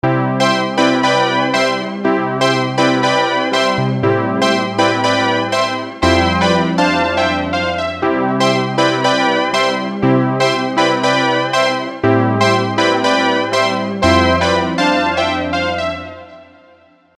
EZkeys 2 Synthwave Gazer; EKX Synthwave 3

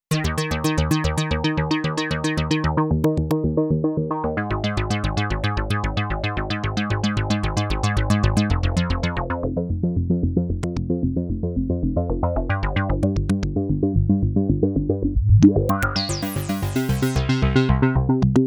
bass line

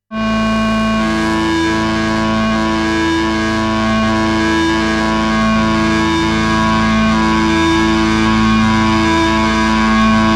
Gamechanger Audio Motor Synth MkII Aggressive

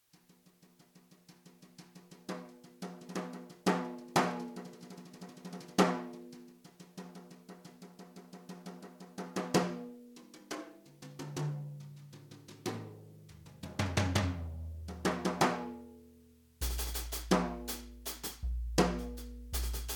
Son of a Brush Kit; ALESIS Strata Prime

